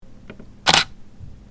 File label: clicking